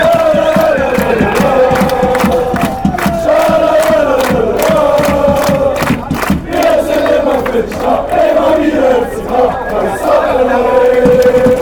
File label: allez, fan chants